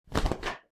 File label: Clothes inventory